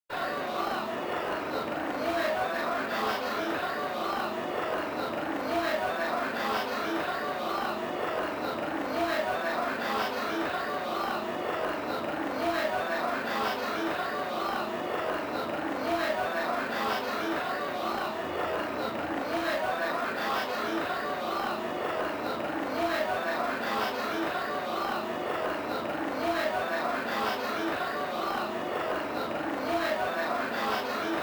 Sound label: Stage Ambient Music; The Last Blade; Juzoh Kanzaki